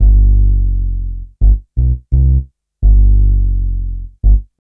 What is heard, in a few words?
Upright Bass, Drum & Bass; Fluid Dynamics